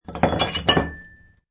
Windchimes drop on table.; Clanks (Sound Effects:Objects)

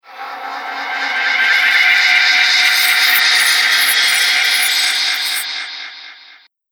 Shrill Spinning Horror; Evil Sound Effects; Andrew Fleming

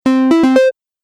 Stage Level Complete; Video Game Sound Effects

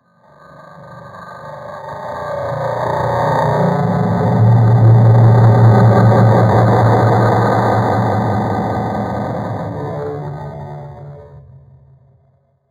Deep Dark Ambience; Scary Soundscape Sound Effects; horror sound effect

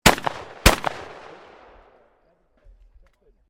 Shotgun 12 Gauge Underover Firing 2 Shots; Sporting Shotguns; Zafer Pointer

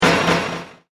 Impact And Echo Fast; Miscellaneous Impact Sound Effects

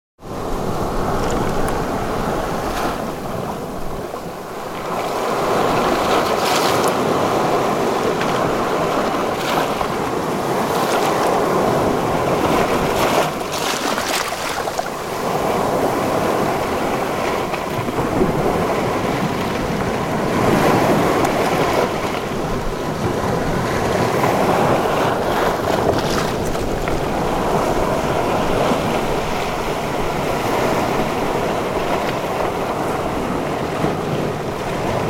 Seashore, Lapping waves on the seashore.; Ocean; Nature

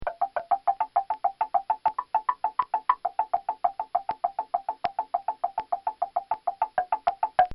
Fast Perculator Patter; Cafeterias

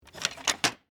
Wood Chair Fold, Close; Foley; Sound Effects:Materials; BlastWave

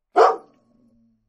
Dog Barks; Dogs; Sound Effects:Animals

